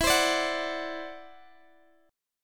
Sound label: E 7th Suspended 2nd Sharp 5th E down Guitar DADGAD